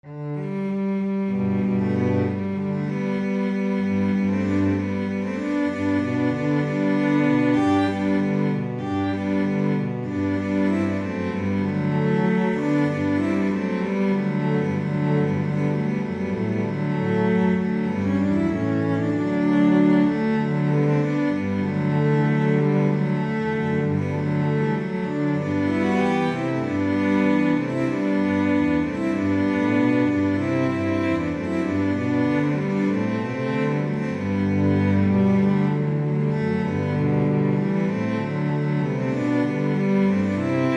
rejouissance, cello trio

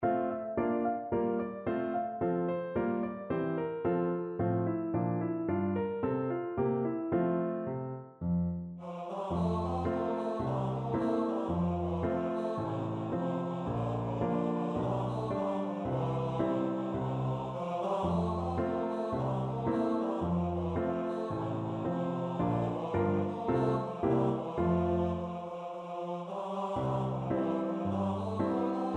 bass, bass voice; a policemans lot

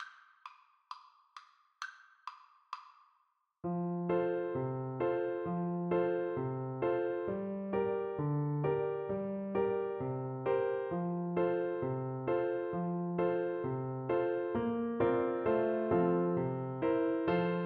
Clarinet

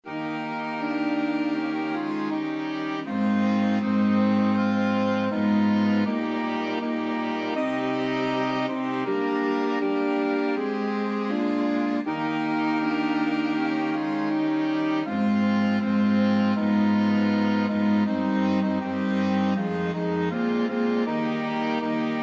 deep river, flexible mixed ensemble 4 players

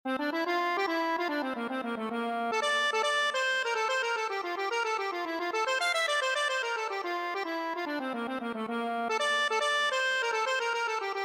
hornpipe, accordion; balfes

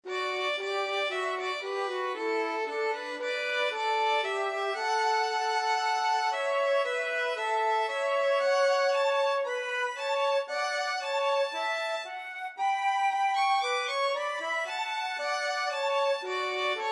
flute violin duet

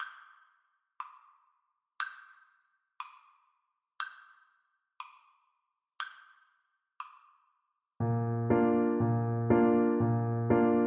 arkansas traveler, clarinet

